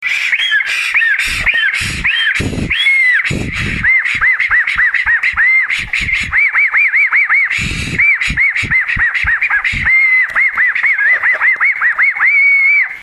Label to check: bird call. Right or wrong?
Right